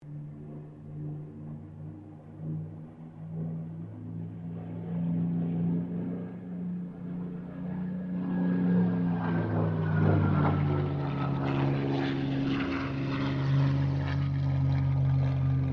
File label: AIRCRAFT SUPERMARINE SPITFIRE MK-XVI PASS